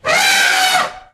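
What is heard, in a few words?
Elephant Single Short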